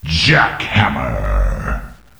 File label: jackhammer; ut